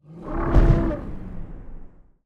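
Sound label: elephant large, weapon; Battle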